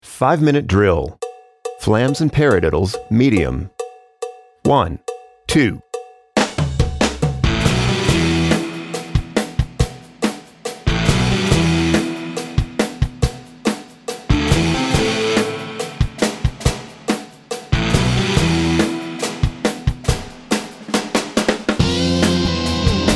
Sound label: pad flams paradiddles medium